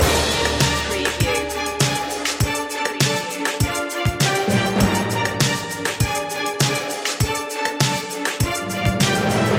spooky loop; media music now

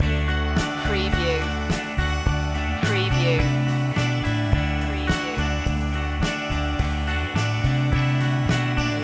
hippy trippy loop

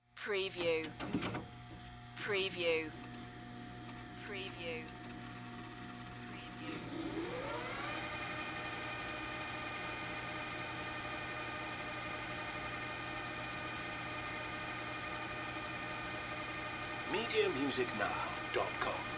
VCR Rewind Tape

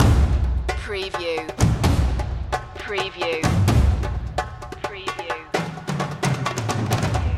storming percussion loop

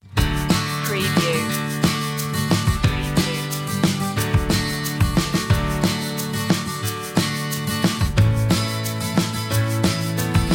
indie feelgood

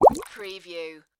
Bubble Surface Single; sound-effects-bible

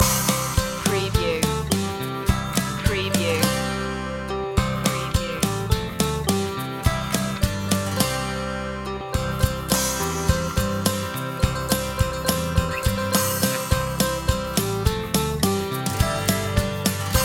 old macdonald had a farm loop

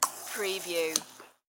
Soda Gun On Off

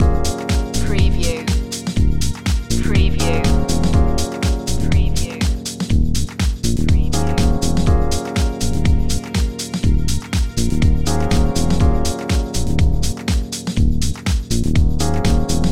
soul club loop

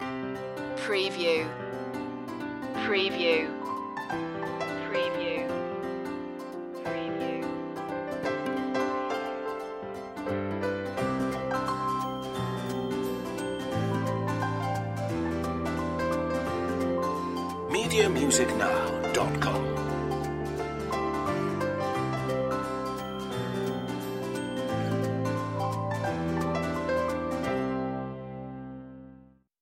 strolling no drums

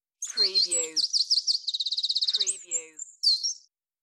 Bird Call; beanstalk audio

